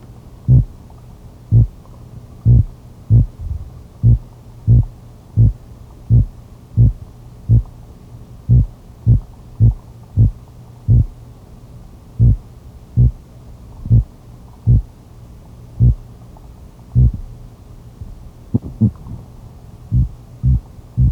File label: epinephelus adscensionis